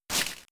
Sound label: Scratch